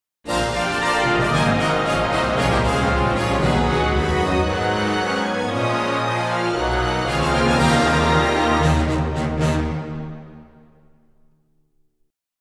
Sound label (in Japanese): ファンファーレ; C83